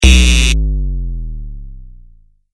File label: incorrect answer